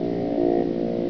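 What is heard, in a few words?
aim9 growl; Falcon4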